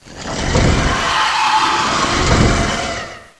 INFANTRY; DINOSAUR

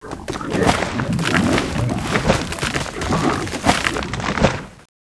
catapult; MIDGARD

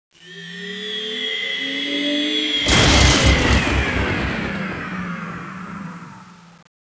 HELI SHOT; MIDGARD

